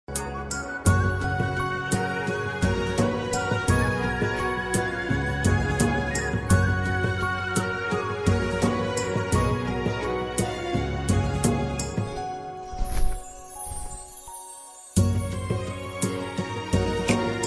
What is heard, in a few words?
Instrumental Ringtone